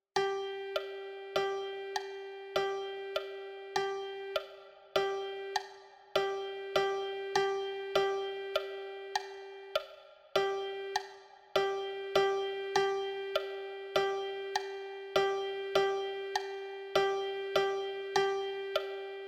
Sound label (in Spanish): percusion